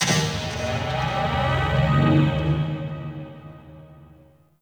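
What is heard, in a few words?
Corpus Ship Tech Moan Texture Medium; Textures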